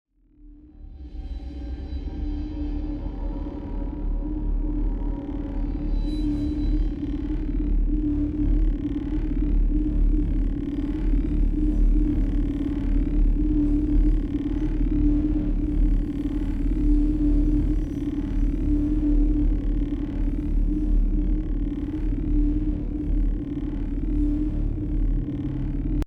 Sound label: reverb before; SFX; SoundsCrate